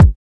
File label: old school verby kick sample F key; kick drum samples